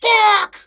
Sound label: lava; bootsector male; midnight guns; bootsector male 00